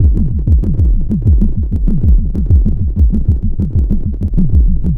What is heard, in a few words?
Synth Bass; Bass X